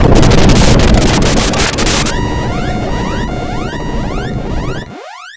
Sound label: explosion; mother2